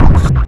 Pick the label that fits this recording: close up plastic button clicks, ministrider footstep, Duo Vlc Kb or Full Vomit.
ministrider footstep